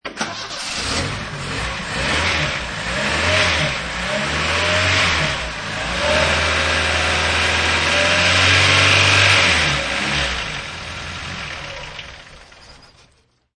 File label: Test Car Engine, Testing a car engine. Turn key, ignition, rev and let the engine run for a littl